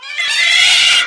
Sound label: Sound Cats